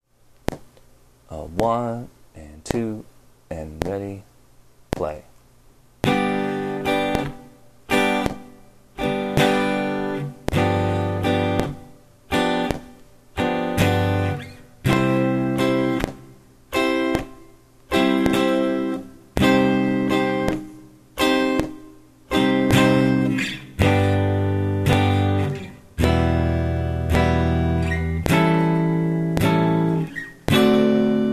shuffle play along slow speed

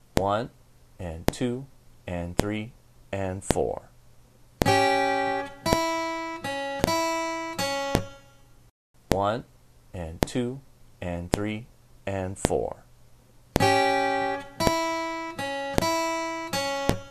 licks

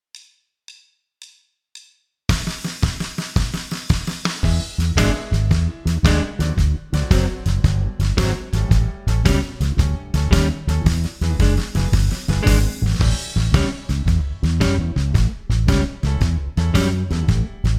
Shuffle A Rhythm

